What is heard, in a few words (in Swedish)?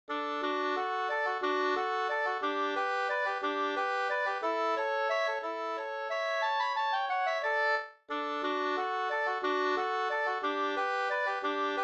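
vals, two-part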